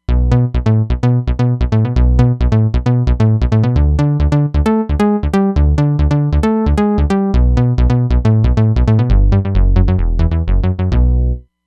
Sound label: MC-TB Bass; Roland Fantom XR